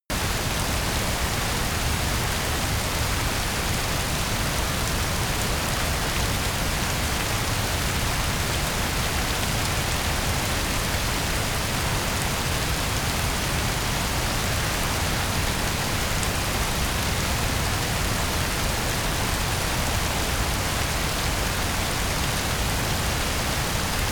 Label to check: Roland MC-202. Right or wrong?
Wrong